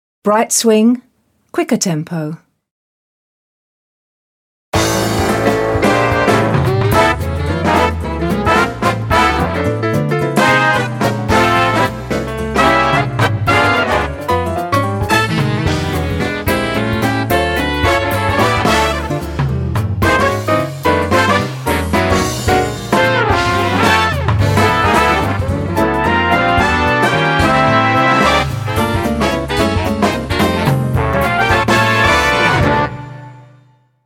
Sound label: Bright Swing quicker tempo